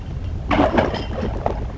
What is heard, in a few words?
DONKY; tapache